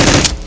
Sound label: machine gun; blobAndConquer; combat